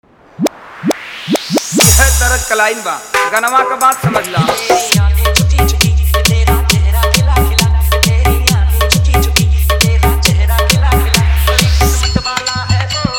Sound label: Edm Drop Mix